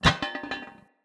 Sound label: Incense Burner Contact Impact